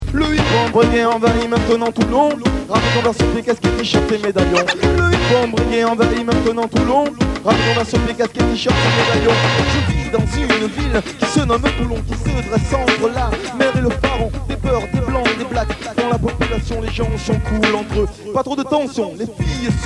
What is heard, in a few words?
Hip Hop; Toulon